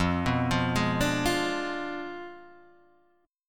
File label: F Diminished 7th F down Guitar Half Step Down